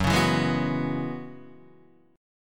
F sharp 7th Suspended 2nd Suspended 4th F sharp down Guitar Open D